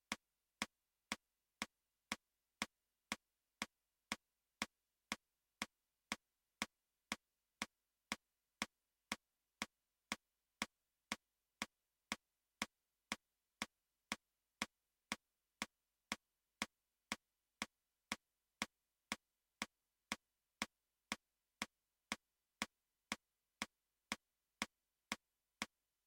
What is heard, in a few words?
3 beats sample